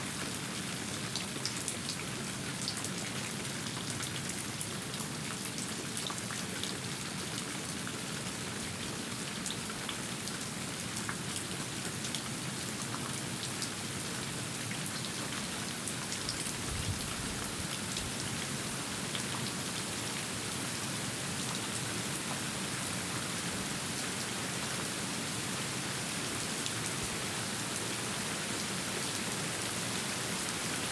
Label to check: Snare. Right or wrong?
Wrong